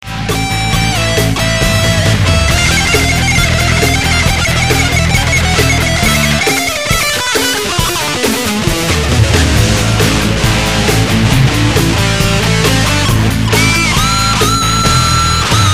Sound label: mad improvised soloing, guitar